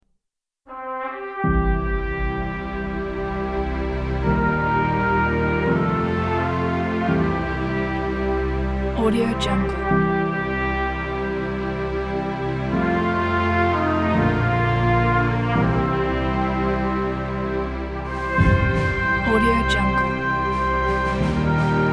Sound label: military cinematic